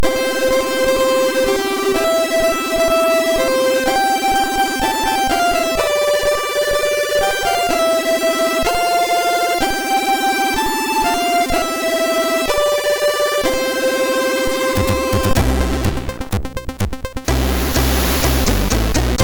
Happy Birthday MOS6581R3; hvsc

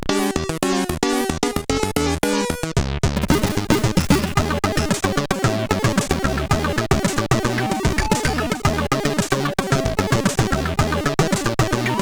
Blufunk Jingle, sid CSG8580R5

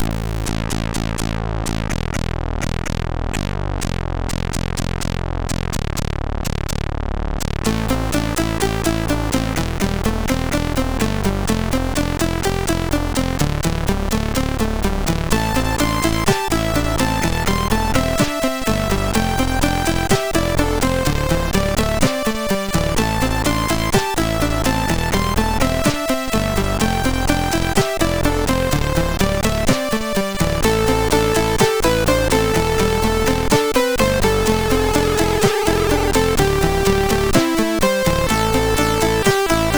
Music One, MOS6581R3

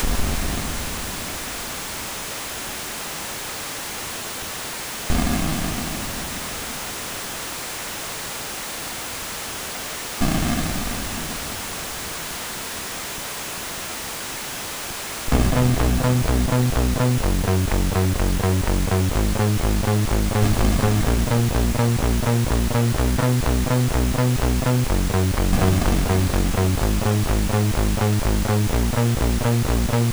Acid Rain, MOS6581R4

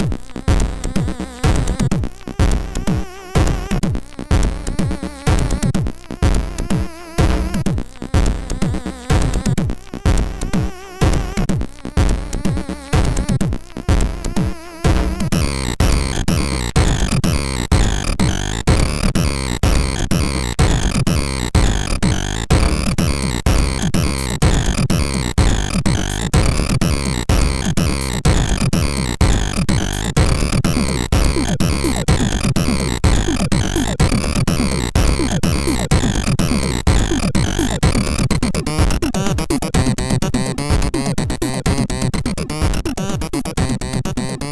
Industrial, sid MOS6581R4; Astovel

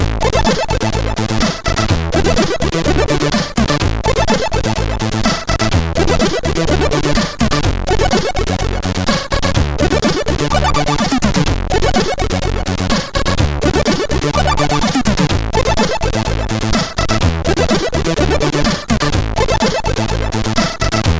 New Idea, MOS6581R3

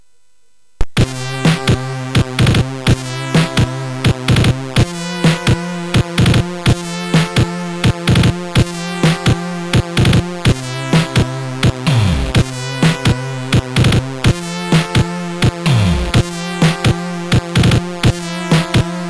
Dreamy, MOS6581R3

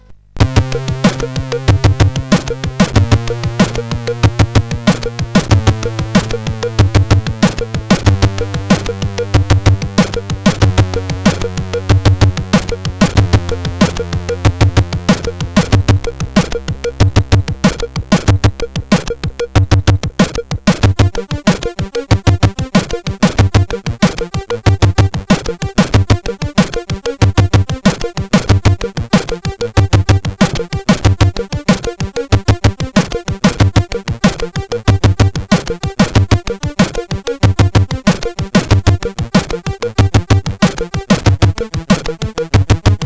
Hyper Aggressive sid CSG8580R5

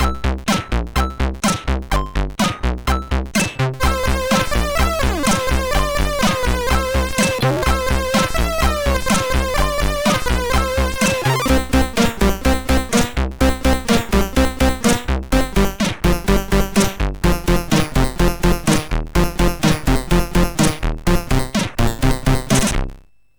sid MOS6581R3; Oldies Goldies

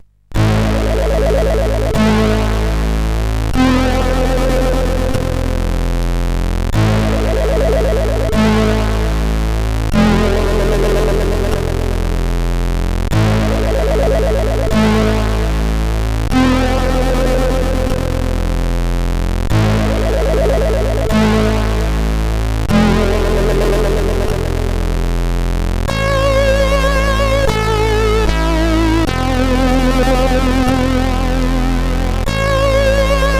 Metal Warrior 2, CSG8580R5

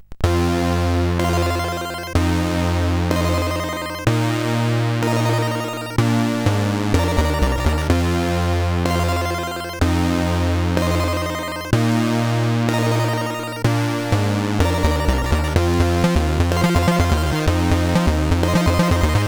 sid CSG8580

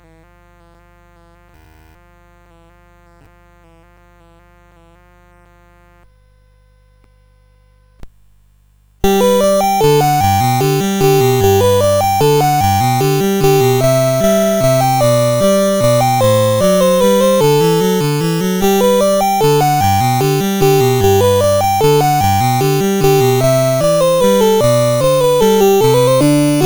Minuet in G, CSG8580R5; Minuet in G No4

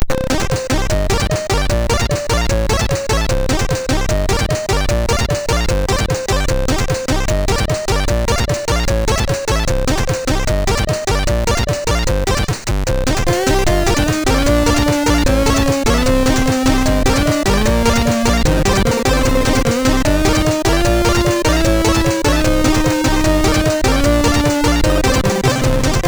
happy b-day, MOS6581R3

